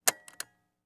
Marantz Pdm201 Stop Unpowered

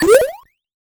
Get Item 8 Bit

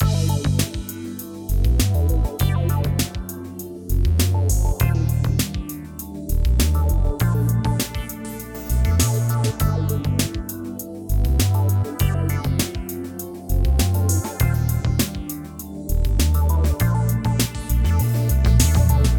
Loop Ambient

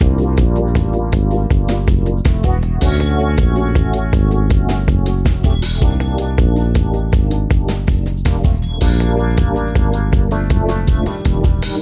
Loop

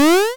8 Bit Jump

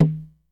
Nord Drum Wood

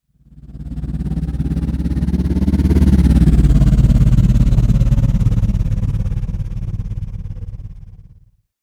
Futuristic Vehicle Pass By

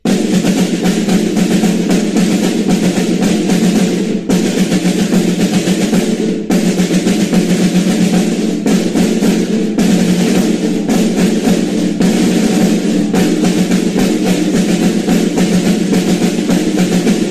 Marching Snare Drum Duo

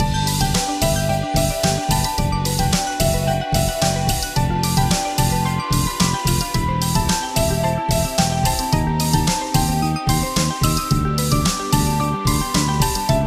Cool Game Theme Loop

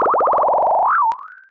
Rolling

